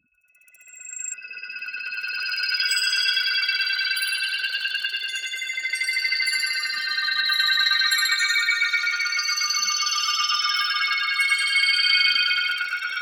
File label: TEXTURE Metallic Field; boom library; Neon